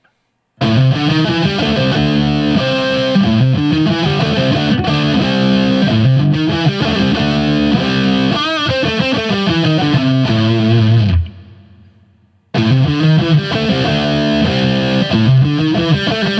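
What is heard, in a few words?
Guild S300 Angry 800 Riff, guitar; Axe-FX Ultra setup through the QSC K12 speaker recorded into my trusty Olympus LS-10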